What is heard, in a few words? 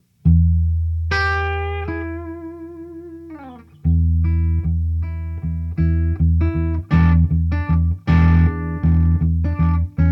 Peachfuzz Low Gain; pedal; FRANTONE